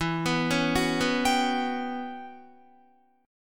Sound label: E Minor 6th Add 9th E down Guitar; arpeggiated; 4E,4A,5D,5G,5C,6F; Em6add9